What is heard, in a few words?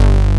MGP BASS; Pro-Rec - Synthsonic; JV ANALOG